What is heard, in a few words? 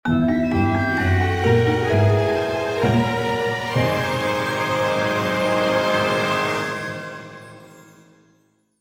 Cutscene Victory